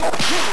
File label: ik punch; ikplus